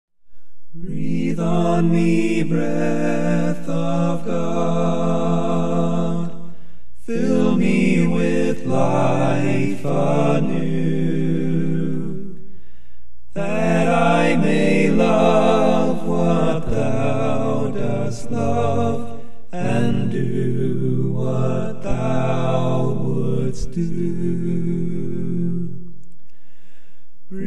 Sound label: a capella hymn; breathe on me breath of god